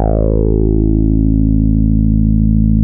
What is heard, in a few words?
TAURUS C3, synthesizer